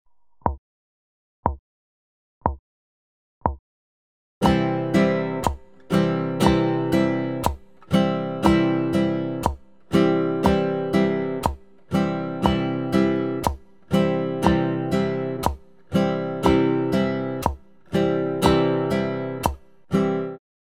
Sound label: strumming; strumming nr 10